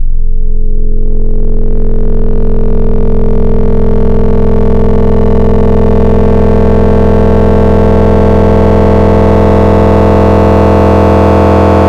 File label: FM Table, FM Wavetables; Cymatics; SERUM; FM Table 5